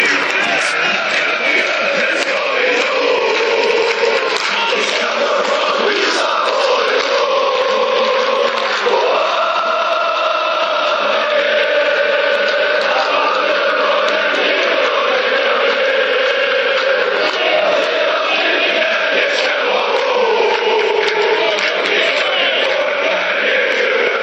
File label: everybody knows that djurgarden is not to mess wit, fanchants sweden